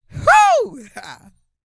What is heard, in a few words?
YELL, MALE HUMAN; Spectrasonics Vocal Planet